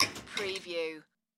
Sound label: Dishwasher Remove